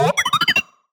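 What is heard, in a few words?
Sci-Fi Droid Robot Emote; jasem just another shooter with electronic music windows 2017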